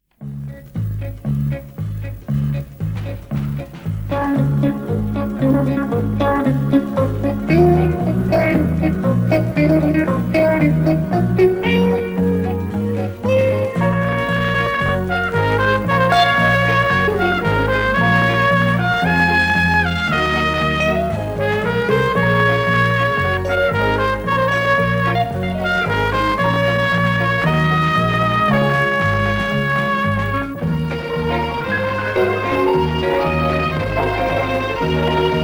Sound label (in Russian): Мелодия, Инструментальная музыка; Мелодии из кинофильмов